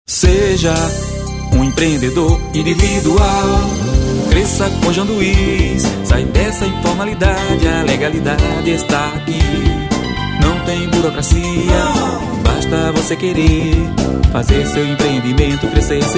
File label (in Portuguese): jingle; empreendedor individual